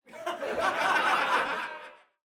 WALLA - Crowd laugh and shout - Interior - Medium; Crowd Laughter

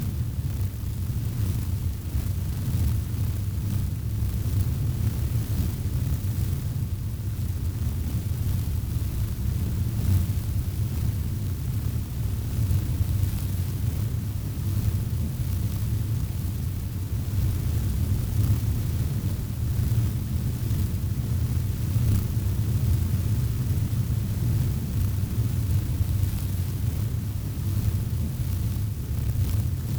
Mutant insects Hornet Swarm Internal Dense; Mutant Insects (FLYING); Red Libraries

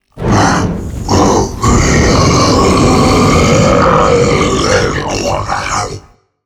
Fire Monster Vocals; Monster Sounds & Atmospheres; Olivier Girardot

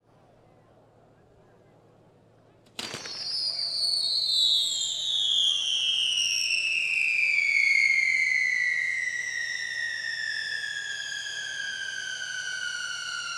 Spinning wheel with whistle, Fireworks Malta; Sound Villain

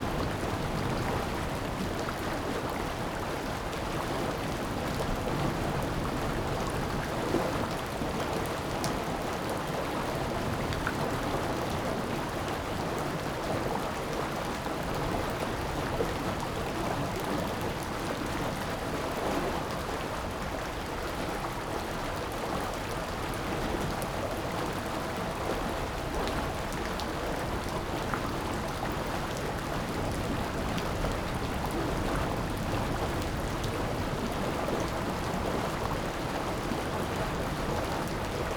Rain drainage pit MS STEREO; Rain in urban and natural environment; Ivo Vicic

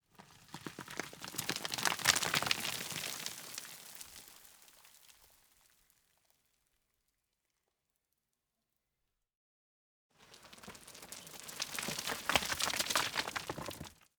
ROCKS Falling Heavy Dusty Debris; Tatak Audio; Rocks and Debris

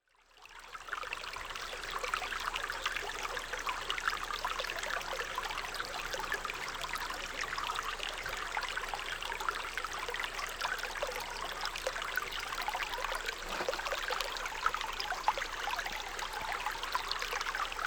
AMBIENCE Water Soft Spring Small Bubbling; Asian Countrysides; SmartSoundFX

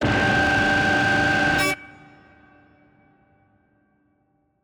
Scream, Complex Cinematic Horror Sounds

